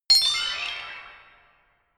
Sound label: notification glockenspiel horror slide up hard